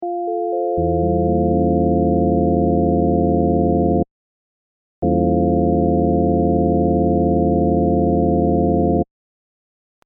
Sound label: FM over E dim7